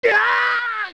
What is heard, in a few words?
Smashed; Link